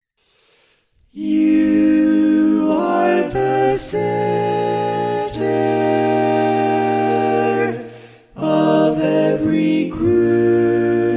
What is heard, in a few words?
Tenor, barbershop tag